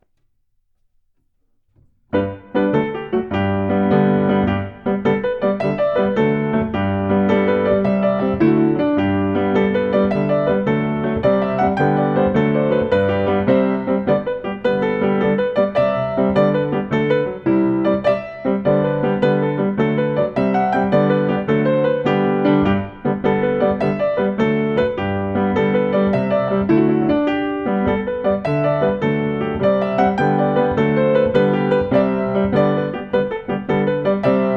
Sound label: take it easy 120bpm, ballet class music